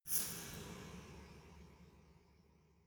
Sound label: resonator hit single soft